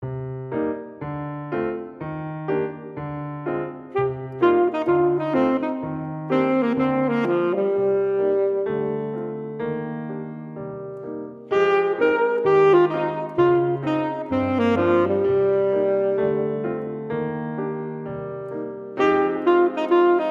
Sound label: saxophone; rhythm & rag for saxophone